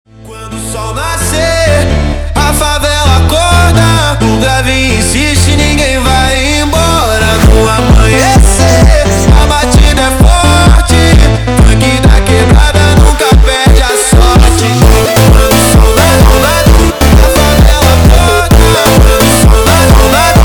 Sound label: Phonk Montagem